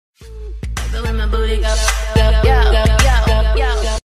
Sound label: notification tone sms tone; bildirim sesleri